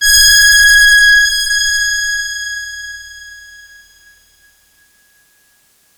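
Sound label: big brass G#5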